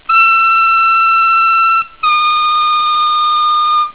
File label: 9 blow, diatonic bending; Hole 9 Blow Bend